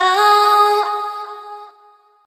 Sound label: "oh" sung